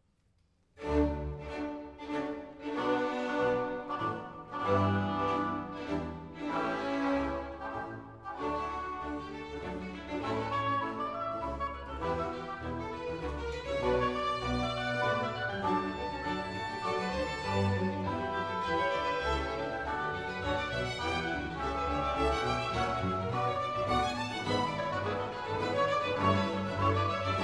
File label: Cantata Chor; J S Bach; Wachet auf, ruft uns die Stimme; BWV 140